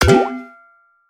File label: mission increase; aronwk collection; ndaudio